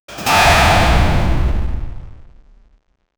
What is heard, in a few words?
a short sharp failure sou, game